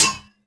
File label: hit metal; cortosis; Star Wars Obi Wan; XBOX